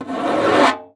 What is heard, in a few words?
STREI FG, BONGOS; Xsample VOL-9